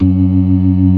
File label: ORGAN, NO PERC